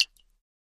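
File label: Drip Small Drip After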